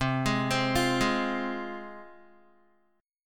C Suspended 4th Sharp 5th, C, down, Guitar; Csus4#5 arpeggiated

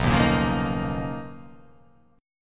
B Major Sharp 11th

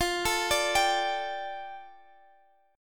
F 6th Add 9th Guitar Drop D, chord; x,x,15,14,15,15

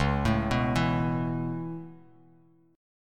C sharp Minor 7th Sharp 5th C sharp; C#m7#5; arpeggiated; JGuitar

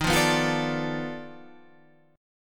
B 7th Sharp 9th Flat 5th, guitar

